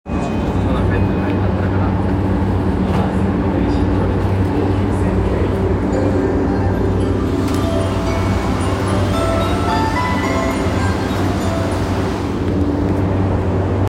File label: train sounds; 南北線麻布十番南